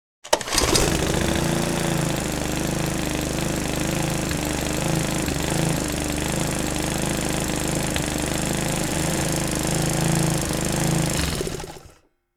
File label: Electric lawn mower garden mowing the lawn